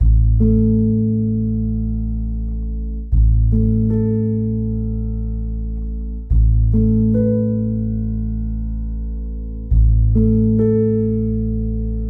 True Crime Piano Minimal; background music

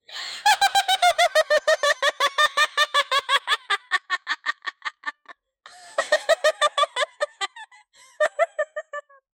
Laughing Witch

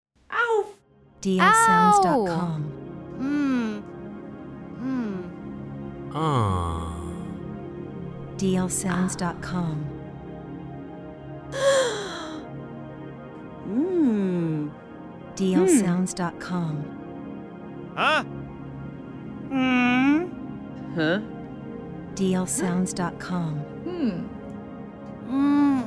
Groans Sighs; toilet flush

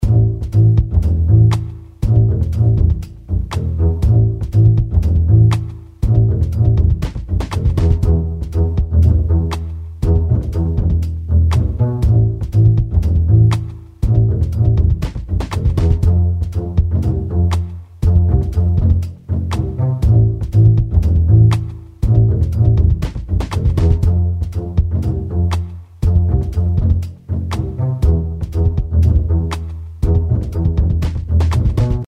Pizz Bass Loop

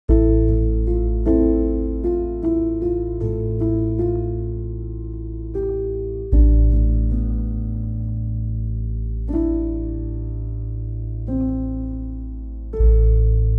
True Crime Piano Melody; background music

